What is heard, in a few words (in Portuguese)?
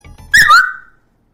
apita